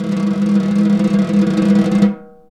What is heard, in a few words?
Snare, Roland LA Composer